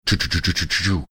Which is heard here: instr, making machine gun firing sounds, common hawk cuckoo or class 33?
making machine gun firing sounds